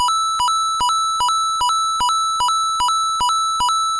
coin continuous; mario

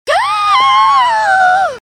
gasp

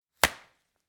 slap with glove

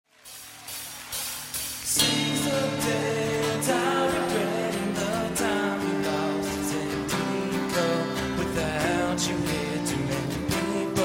live, sings singing; summer sonic 2007

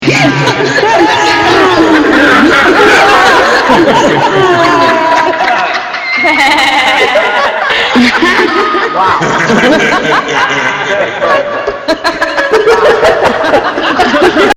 laughs reverb; wakanda

